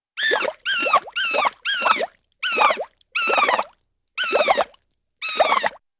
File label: Small Squeaks w-water Bubbles; Cartoon; Hollywood Edge; Cartoon Trax